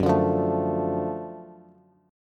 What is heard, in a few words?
Gb Minor Major 7th Sharp 5th Gb down Bass; GbmM7#5 strummed; JGuitar